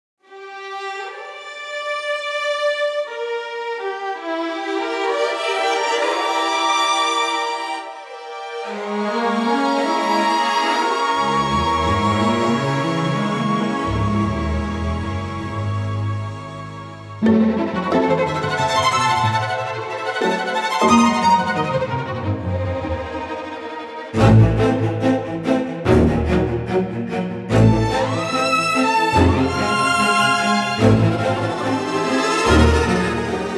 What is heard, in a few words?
Complete dimension strings; Dietz